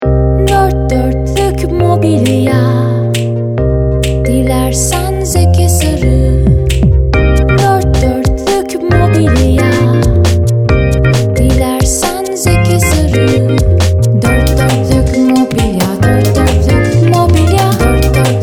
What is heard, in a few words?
jingle